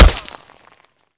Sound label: body hit